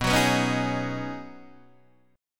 B 6th Add 9th B Guitar DADGAD, chord

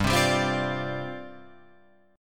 G 7th Suspended 4th G down Guitar Open F

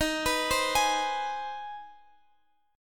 C Minor Major 7th Sharp 5th D sharp

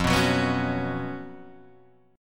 B Suspended 2nd F, chord; strummed; JGuitar

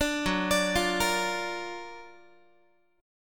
F sharp Augmented Major 7th F sharp Guitar; arpeggiated; F#+M7; 17,14,17,15,14,x; 4A,4E,5A,5D,5G#,6C#

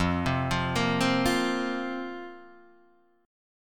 F Suspended 4th F, Guitar Half Step Down; Fsus4

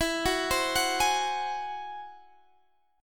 G sharp Augmented 7th

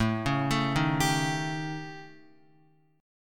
A 7th Flat 5th, down, Guitar; A7b5 arpeggiated; JGuitar

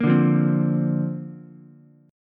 D-Minor 7th Sharp 5th, D, Ukulele; Dm7#5 strummed; 3,2,1,3

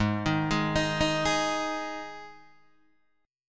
G sharp 5th G sharp Guitar DADGAD

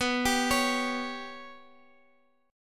B Suspended 2nd Sharp 5th down Guitar Open F; Bsus2#5 arpeggiated; JGuitar

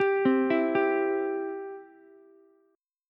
C Major, C, down, Ukulele; arpeggiated; chord; JGuitar

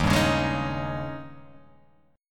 Eb 11th, chord; strummed; JGuitar

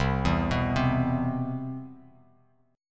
C Major 7th, Bass Standard; CM7 arpeggiated; JGuitar

